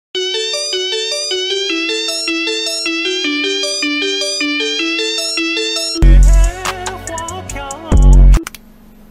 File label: Pop Cat; Xiaomi